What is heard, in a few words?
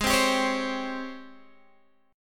G sharp Major 11th G sharp, Guitar DADGAD; 18,15,17,18,15,x